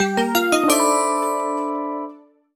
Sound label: Jingle Toy Sports Openning Toy; Clubhouse Games: 51 Worldwide Classics